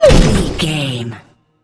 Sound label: sci-fi weapon rifle laser shot